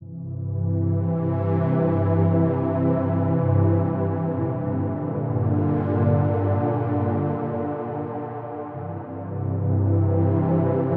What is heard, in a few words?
pad mix